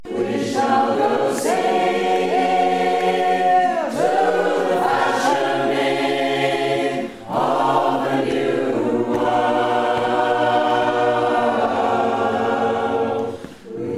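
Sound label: we shall go singing all high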